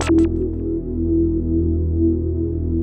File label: DANCE SYN, Synths & Keys, Techno Trance; E-MU Formula 4000 Series Vol. 2